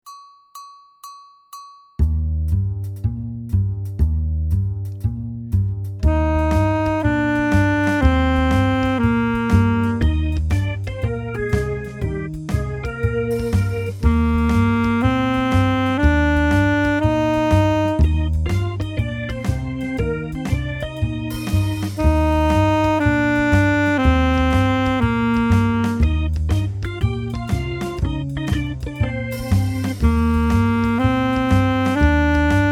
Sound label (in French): saxophone; mib